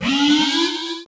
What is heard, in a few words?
alert